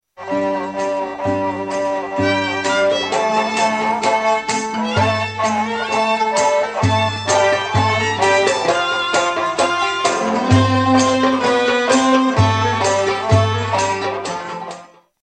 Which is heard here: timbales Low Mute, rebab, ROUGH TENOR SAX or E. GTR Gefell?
rebab